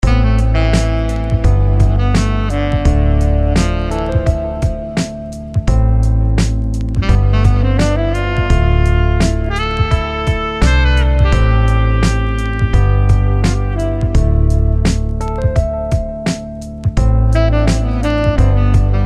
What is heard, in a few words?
Smooth Sax Riffs